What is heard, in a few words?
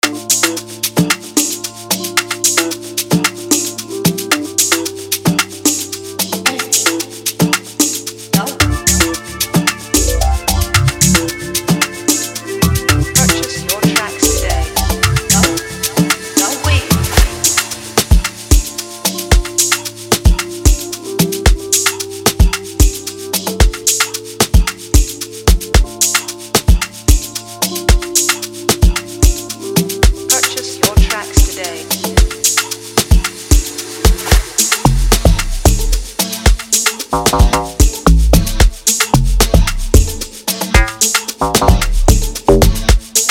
FREEBEAT